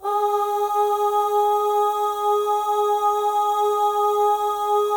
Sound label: OH, FEM, Symphony of Voices